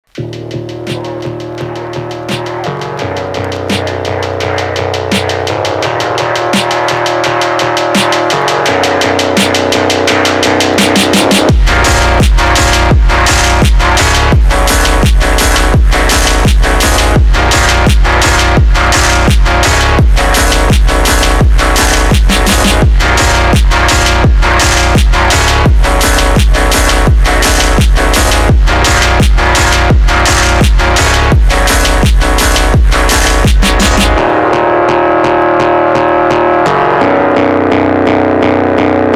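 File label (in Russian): фонк slowed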